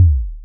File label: seal